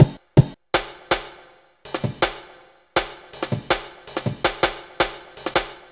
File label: 162 Dinky Break; breaks; m8 breaks